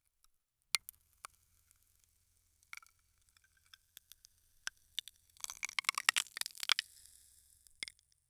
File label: ice sparkle; glittering